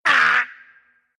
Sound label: pain, ru; dota2